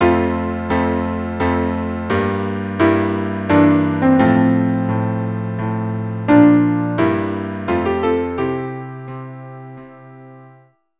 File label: quartet; Largo from Xerxes